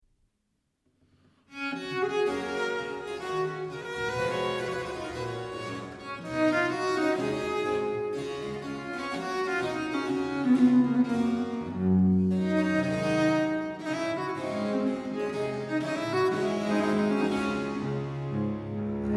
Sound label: Suite no.VII in G major Courante